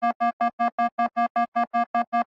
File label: shield depleted; Barotraumatic